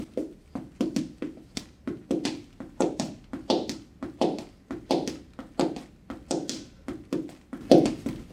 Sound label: Sneaker taps